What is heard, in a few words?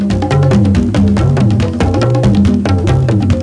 RHYTH, LOOPS